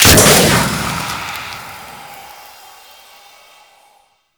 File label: Infested Pistol Fire; Weapons